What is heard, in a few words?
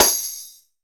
Tambrin Hit, Tamborim, Percussion; Roland BK-5